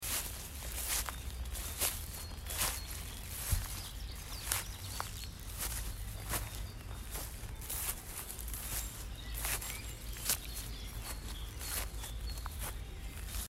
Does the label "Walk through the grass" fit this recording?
Yes